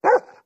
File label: Dogs Medium Size Dog